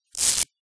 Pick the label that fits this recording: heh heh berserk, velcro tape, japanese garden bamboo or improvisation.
velcro tape